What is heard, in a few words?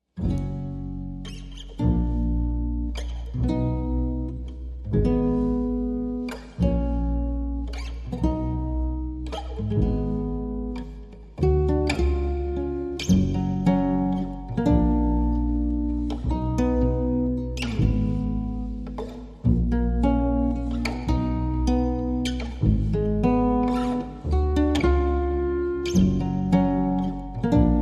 Guitar and Bass